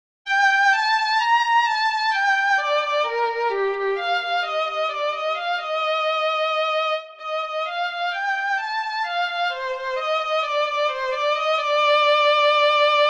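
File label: violin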